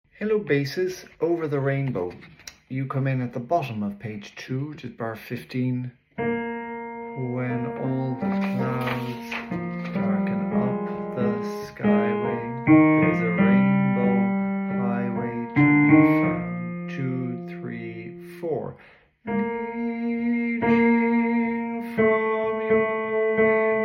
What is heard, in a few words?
bass